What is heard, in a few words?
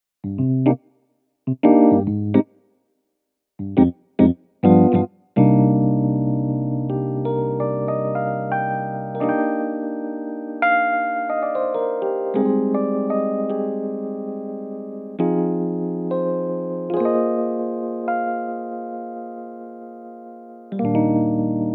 Roland FP E50 E Piano